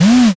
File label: servo